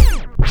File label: SCRATCHES; Scratch 1